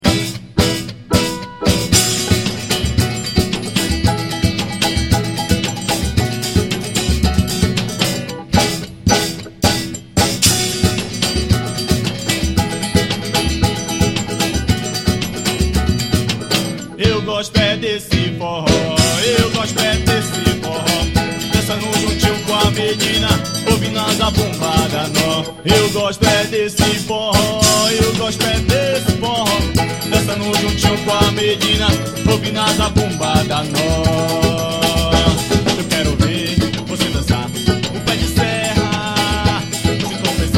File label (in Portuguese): forro